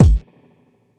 kick; eddyflux; crate bd; dough-samples

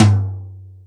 Dry T, BossDR660 ht; drum machine

